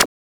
Tech Zip; Genesis

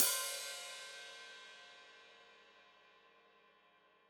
Hat Foot Open, Hi-Hat; Deeply Sampled Drums; Cymbals Stereo

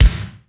TIGHT KICK, ACOUST KICKS; Greytsounds

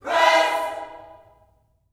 PRAISE, Gospel; Spectrasonics; Vocal Planet; GOSP PHR

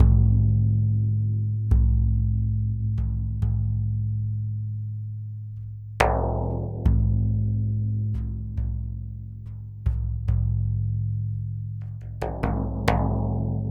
Frame Drum, Celtic; Sonic Foundry (Sony Creative Software); Continental Drift Aftershocks; Percussion

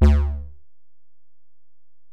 Bell, Alesis DM-PRO

